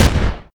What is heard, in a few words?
machine gun, weapons; q3rally